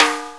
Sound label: Snare B; Sonic Foundry (Sony Creative Software); Drum Tools